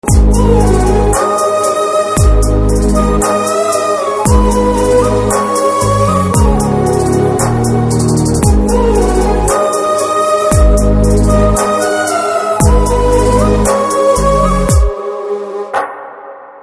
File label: BGM Telugu Ringtone